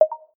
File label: hint